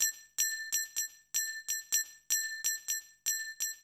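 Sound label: percussion, house, 125; eJay; Drum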